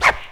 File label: Scratch, FX Hit; One Shots; Drums; Slackjaw; Ableton Live 12 Lite; Core Library